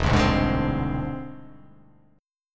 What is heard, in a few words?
E Augmented 7th E down; E+7 strummed; JGuitar